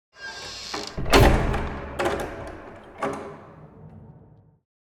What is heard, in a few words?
Metal prison cell door slide close and latch